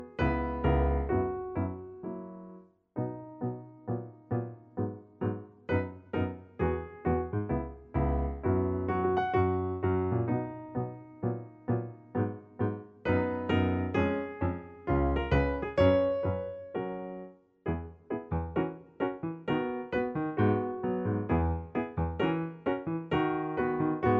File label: INSTRUMENTAL